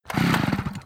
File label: chainsaw start